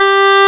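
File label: woodwind G3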